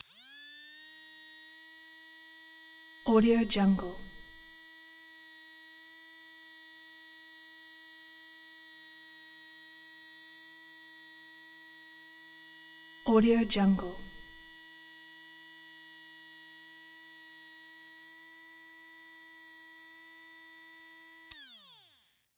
blast drive fan run; prosoundfx

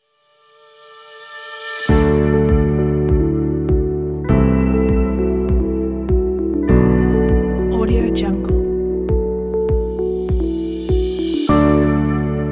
corporate ident

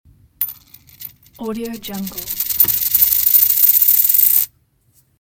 coin spinning

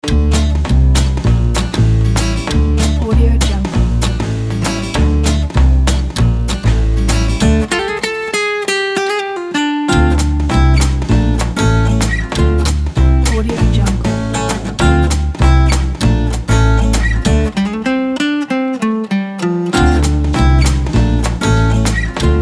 jazz quirky; raspberrymusic

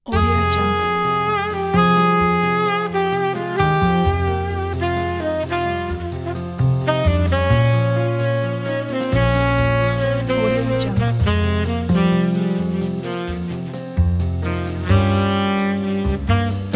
sad hopeless saxophone

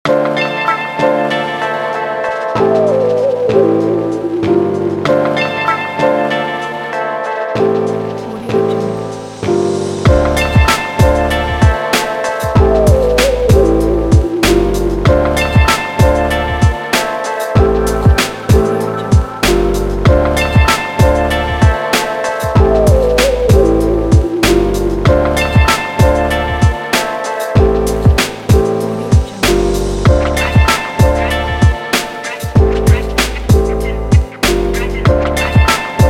lets hiphop

